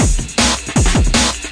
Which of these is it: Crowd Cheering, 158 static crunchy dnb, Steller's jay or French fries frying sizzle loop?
158 static crunchy dnb